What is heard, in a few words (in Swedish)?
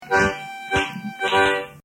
SMS-fanfar; ringsignaler; skralbergs